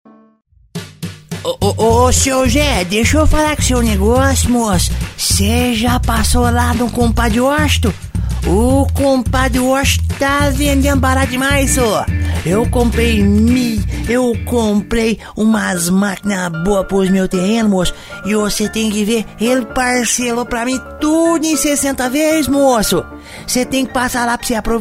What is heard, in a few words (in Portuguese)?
caipira